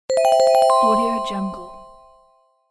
the correct answer

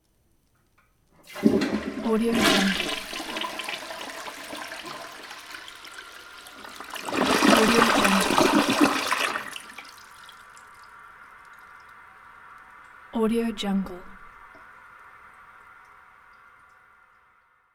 toilet flush

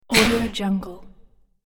snotty little monster gets killed; sonic-boom

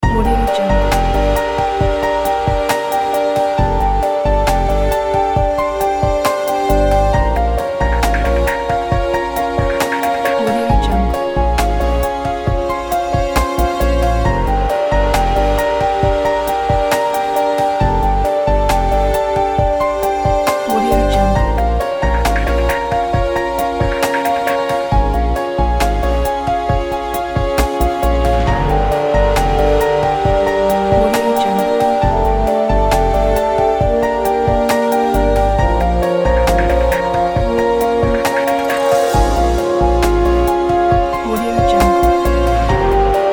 heroes of destiny loop; garsumene

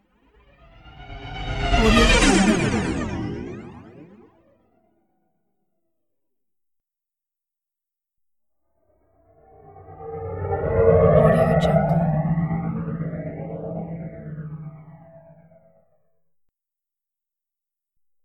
spaceship passby

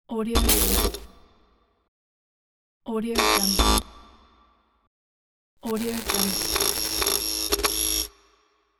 circuit neon lamp

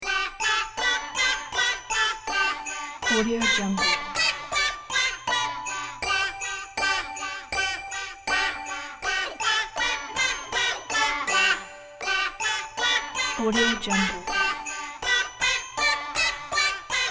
kids cartoon comedy toy piano

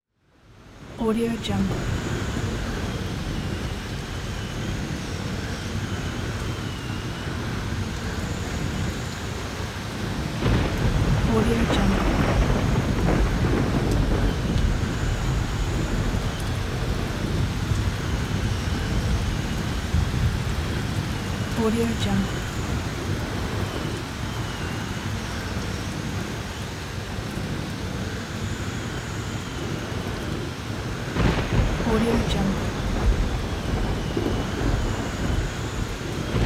tsunami

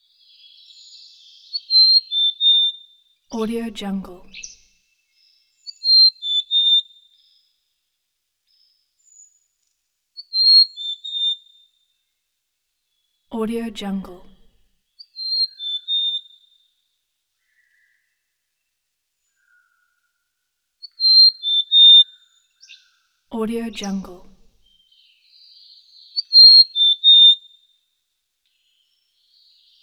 quail chirping; hollywoodedge

